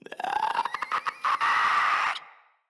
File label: Infested Runner Chasing